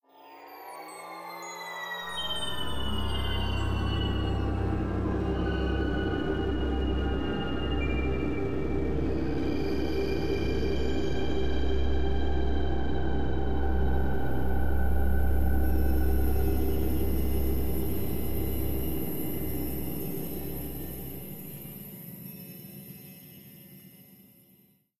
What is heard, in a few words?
Intro Ambient; Spider-Man 2 The Game